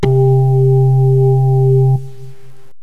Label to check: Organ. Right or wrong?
Right